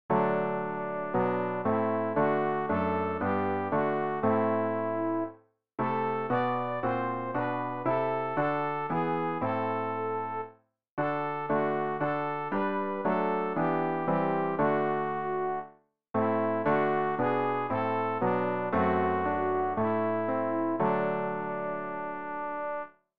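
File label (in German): sopran, Übehilfen; Ref. Gesangbuch; RG 070: Das ist mir lieb, dass du mich hörst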